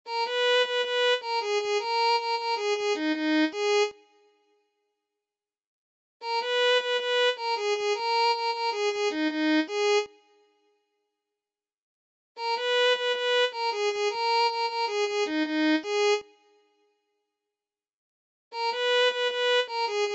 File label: Violin